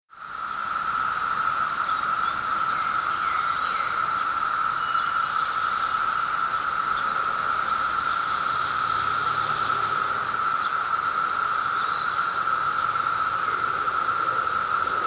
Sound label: cicadas